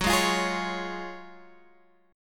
Gb Major 7th Suspended 2nd, Guitar Open G

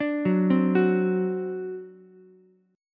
C Suspended 2nd Flat 5th C down Ukulele; Csus2b5 arpeggiated

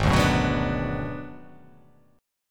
Ab Minor 7th Flat 5th Ab, down; strummed; JGuitar

